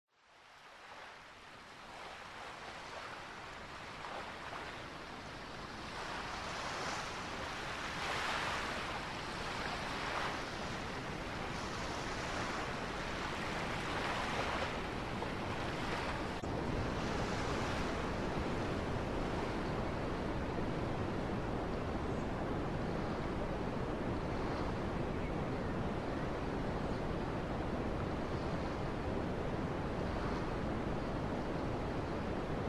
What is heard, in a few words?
Water